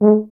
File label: BRS TUBA, Solo Instruments